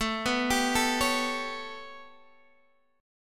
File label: B 7th Suspended 2nd Sharp 5th, chord